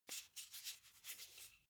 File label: car flip key handling long